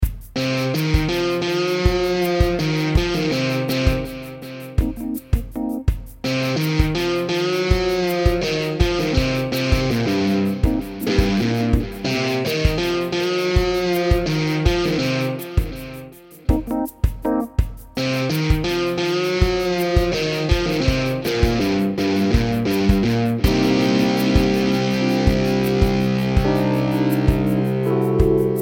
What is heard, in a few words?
guitar blues walk